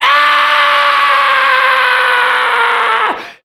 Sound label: scream